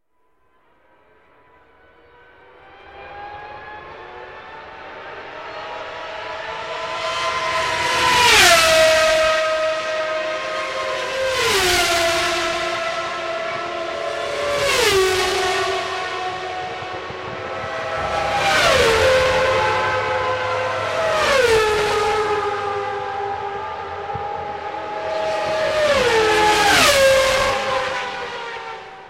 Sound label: sounds of formula1 and formula 3000 sound fx; ultimate loops